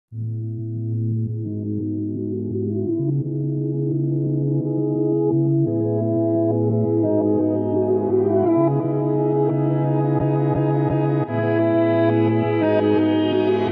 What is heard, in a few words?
instrumental; persian